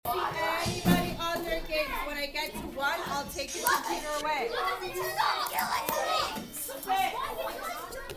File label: kid song